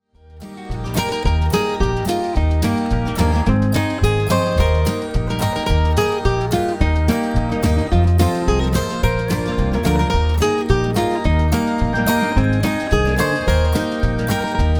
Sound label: guitar fragment